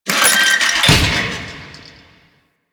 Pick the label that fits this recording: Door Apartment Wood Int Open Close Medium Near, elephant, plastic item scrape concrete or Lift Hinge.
Lift Hinge